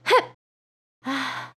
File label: fishing casting fail; warrior